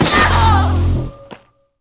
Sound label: pain